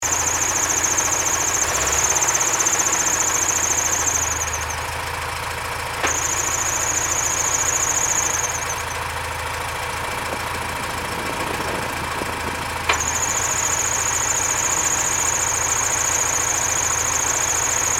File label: transmission, porsche